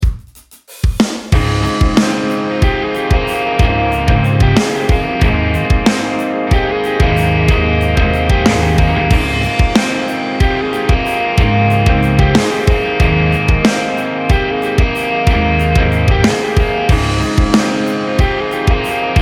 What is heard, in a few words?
Ambient Rock